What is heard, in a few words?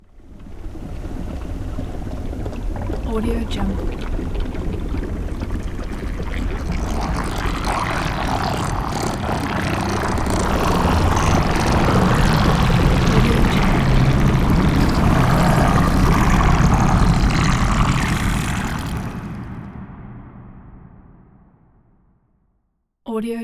textures long abyss alien; sinematic 2; soundmorph